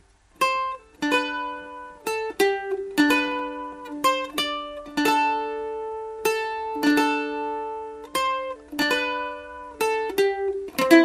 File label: south wind acc